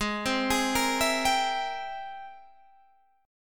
G sharp Augmented Major 9th G sharp, down; G#+M9; arpeggiated

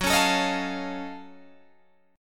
Gb Minor Major 13th Gb down Guitar DADGAD

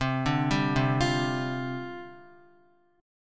C Suspended 2nd Suspended 4th, down, Guitar; Csus2sus4; arpeggiated; JGuitar

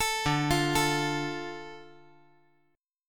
D Minor D, chord

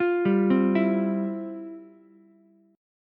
F Major 7th Suspended 2nd, Ukulele; arpeggiated; FM7sus2; 10,7,8,7; JGuitar

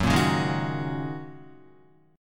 Gb 6th Add 9th Gb down Guitar; strummed; Gb6add9; JGuitar

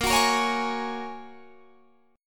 A sharp Minor 7th Sharp 5th, Guitar, Standard; strummed; 18,21,20,18,21,21

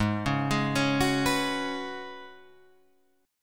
F sharp Suspended 2nd Suspended 4th G sharp

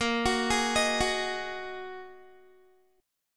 Bb 7th Suspended 4th Sharp 5th, Guitar; Bb7sus4#5 arpeggiated; 18,21,18,20,18,x

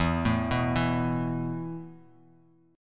E Suspended 4th, Esus4 chord; Esus4 arpeggiated; 12,12,9,9

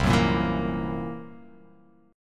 C 7th Flat 5th, C, down

